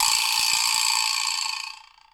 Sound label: Vibraslap Impulse